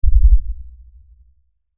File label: LFE Tremor, Lfe Tremor Low Bass Shake Rumble